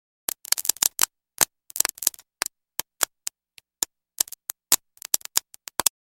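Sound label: wires sparking; provoda iskryatsya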